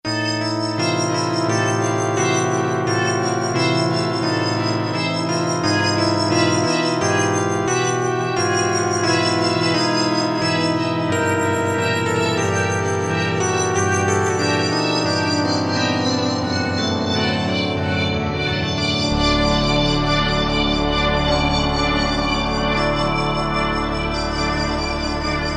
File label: World Muzic; Roland M-DC1